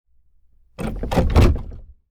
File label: door latch two big grabs turns large deep